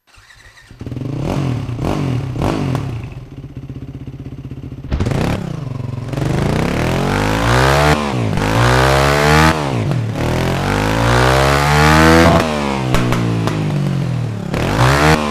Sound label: V Twin Model Revving In FMOD